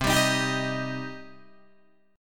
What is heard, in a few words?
C Minor C down Guitar Open D